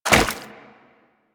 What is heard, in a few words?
Wall Jump Goo; Footsteps; Warframe; Tenno; Wall Run